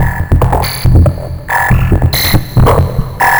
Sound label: Structured Rhythm, IDM Rhythms